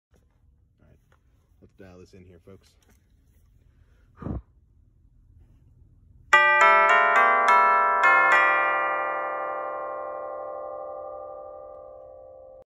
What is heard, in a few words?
Bells, bells instrument